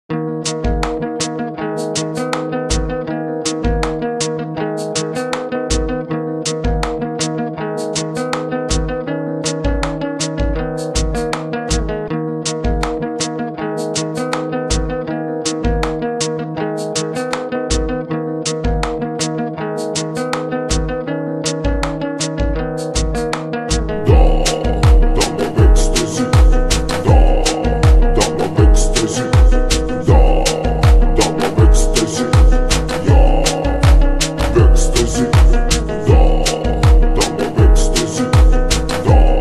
super slowed down reverb

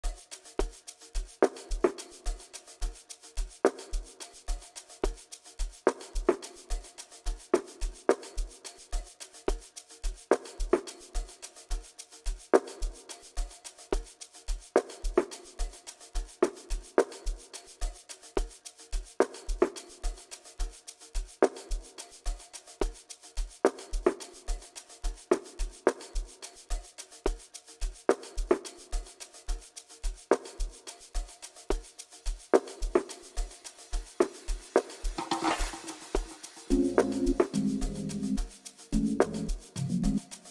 whistle song

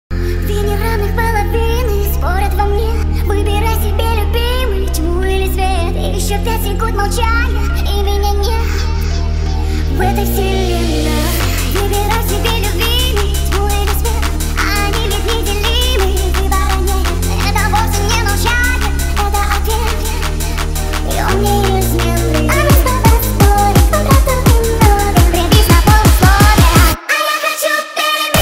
hardstyle remix

